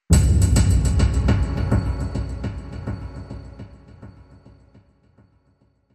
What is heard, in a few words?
Sting